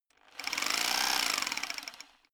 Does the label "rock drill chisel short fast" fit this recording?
Yes